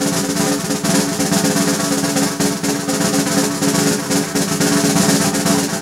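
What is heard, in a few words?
percussion FX, Dance Drums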